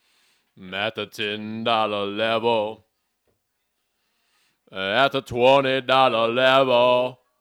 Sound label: vocals background